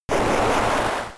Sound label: Waterfall; Pokemon; Gen 3; attack moves; RSE